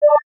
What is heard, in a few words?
coin pick; astro Legends Lyra and Erion Desktop; Foxium